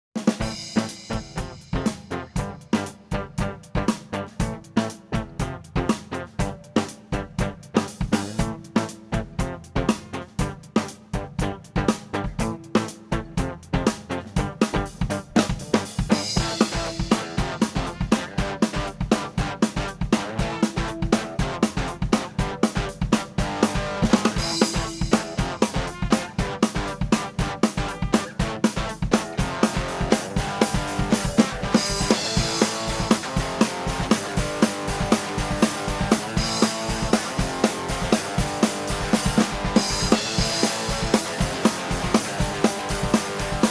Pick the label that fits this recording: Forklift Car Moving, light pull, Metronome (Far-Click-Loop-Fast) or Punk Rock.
Punk Rock